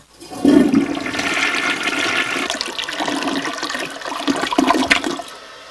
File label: FLSH